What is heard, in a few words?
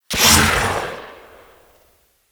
Grenade launcher Tail; Warframe; Weapons; Corpus